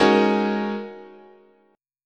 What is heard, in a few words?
Ab 9th Suspended 4th, chord, guitar